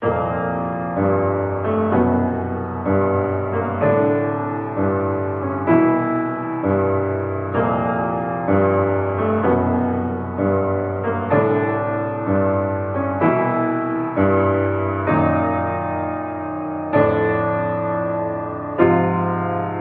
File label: Piano; Zelda64; Gannon